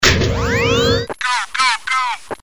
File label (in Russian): Включение ружья